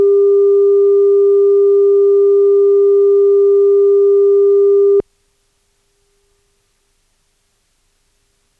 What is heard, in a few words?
CAL 0dB 315Hz